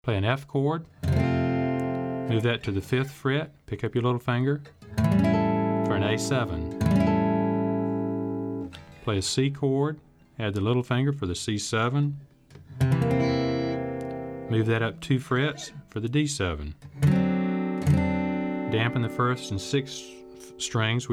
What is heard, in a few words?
Barre Chords